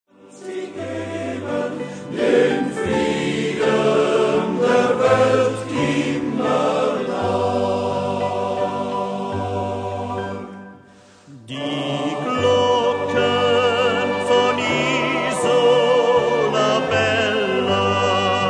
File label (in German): Die Glocken von Isola Bella